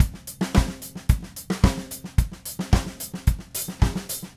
Red Maple Groove 110, DW Red Maple Kit; 110 BPM; Sonic Foundry (Sony Creative Software); Vital Drums

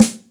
Dry, Yamaha DTXpress Acoustic Snare

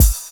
KIT, Dance Mega Drums, HIP

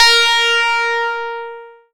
PLUCKED, Synclav FM, A#; Big Fish Audio

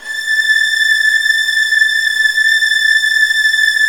Virtuoso Strings; ILIO; Kirk Hunter Virtuoso Strings VOL-1

